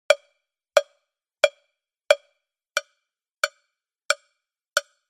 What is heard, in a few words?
Cowbell